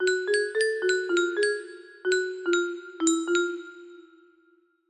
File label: loop, music box